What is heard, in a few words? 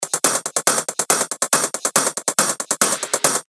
140 BPM, techno loops; revealed techno top loops vol 7; Miami